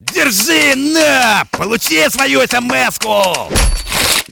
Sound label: SMS